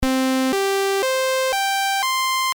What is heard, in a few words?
SAW